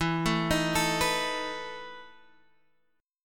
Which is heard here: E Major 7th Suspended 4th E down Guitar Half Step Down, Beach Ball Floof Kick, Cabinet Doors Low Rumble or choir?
E Major 7th Suspended 4th E down Guitar Half Step Down